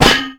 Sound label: bounce; gusanos promode